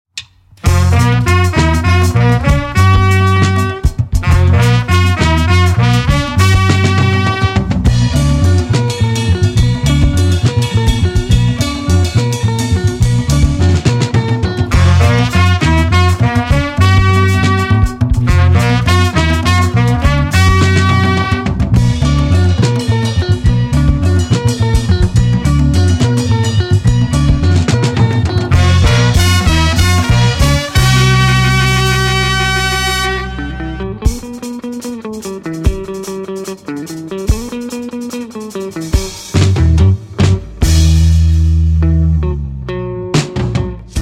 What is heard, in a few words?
Afro Beat